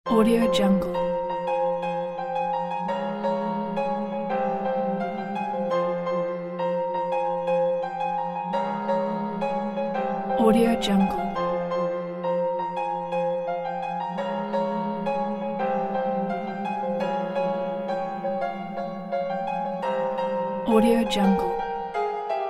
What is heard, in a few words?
Lyrical Piano Hip Hop